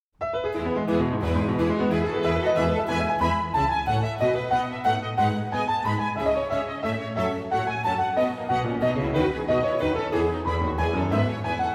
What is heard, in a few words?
Solo Concertos, Keyboard; Bach; Sondeckis